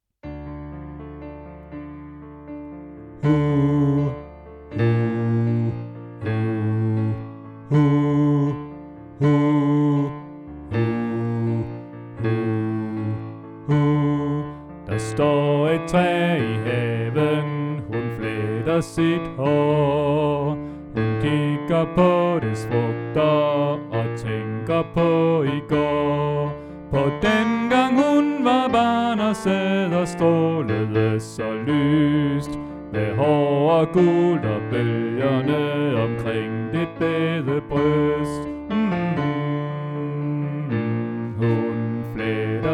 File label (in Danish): Bas; oevefiler